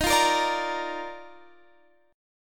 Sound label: D sharp Major 7th Suspended 2nd, Guitar, Standard; strummed; capo 3; x,18,15,15,15,18